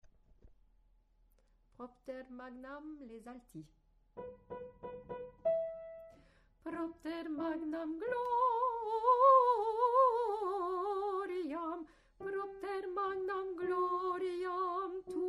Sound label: Alto; Vivaldi - Gloria - Propter Magnam